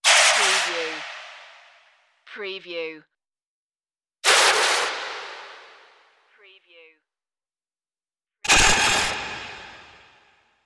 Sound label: sudden alien escape